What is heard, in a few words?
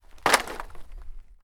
Dropping Wood